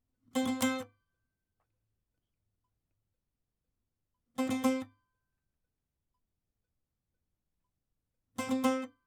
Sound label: saz